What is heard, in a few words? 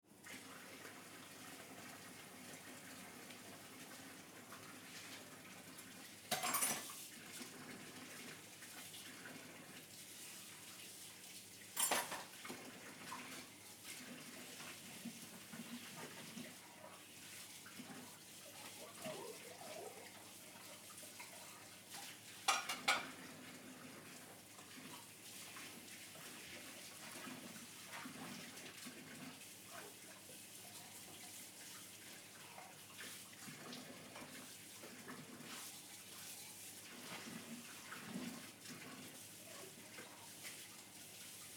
washing dishes room tone; atmosphere